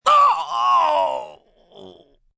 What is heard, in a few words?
Pain Cry, Pain, male, intense cry from impact, agony, moan; human voice